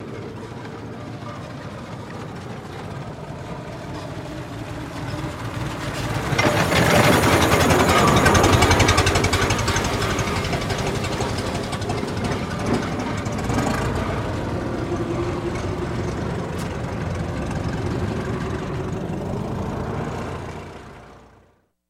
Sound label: Antique Car By